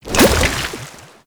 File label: Paint Pickup; Gameplay; Warframe; Shadowgrapher